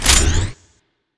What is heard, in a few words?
mode start; zmall; zm boost; privilege